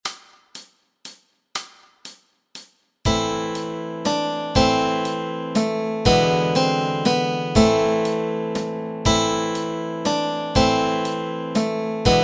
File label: We Three Kings melody and chords